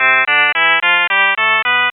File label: harmonic scaletone; shepard tone